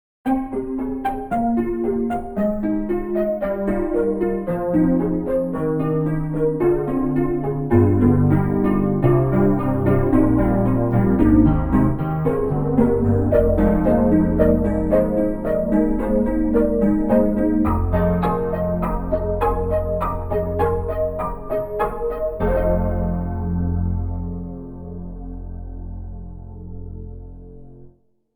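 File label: Broken Piano Piano For Sale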